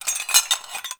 Metal Rattle; Warframe; Tau; Ambience

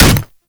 Grineer Manic Claw Hit

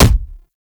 Explosion Punch Generic Small; Explosion Punch Layers; Weapons

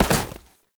Step Snow; Warframe; Oraxia; Foley

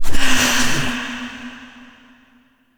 Hand Compass Cast; Warframe; Duviri

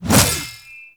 Shield Whoosh; Warframe; Duviri; Dax; Mace And Shield

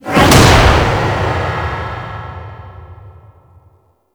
Repel Blast; Warframe; Mods

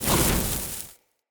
Short Sword Fire Throw; Weapons; Elemental

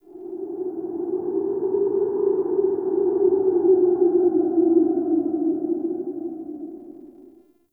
Forest Cave Howl; Warframe; Grineer

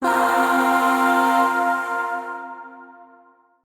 Shawzin Hirajoshi Chord; Warframe; Zariman; Void Shawzin; Chords

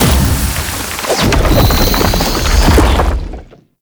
Octopede Lash Limbs Charge, Enemies; Warframe; ManInTheWall

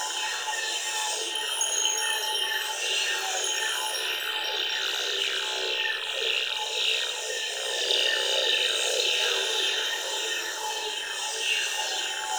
Connector Energy Ball Loop; Warframe; Entrati; Ambience

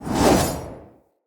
Long Sword Whoosh Heavy; Weapons; Mother

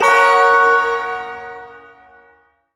Shawzin Hirajoshi Chord; Warframe; Duviri Ersatz Shawzin; Chords

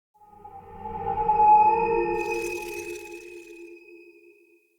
Root Cave Psychedelic Moan Texture; Ambience; Neathuns Root Cave

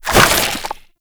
Infested Arm Cannon Idle; Weapons

